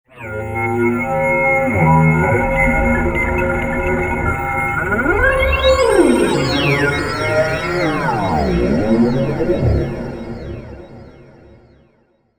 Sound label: Wailin Blue, v-synth